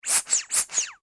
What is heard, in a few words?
toy duck rubber squeeze; Toy Animals; Toys